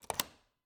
Cabin, front door, deadbolt, lock, latch, thick, open, hyper; Eiravaein Works; Vaeyan IV